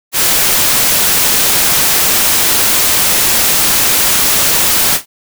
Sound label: whitenoise